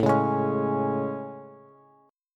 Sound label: A Major 7th A, Bass; AM7; strummed; JGuitar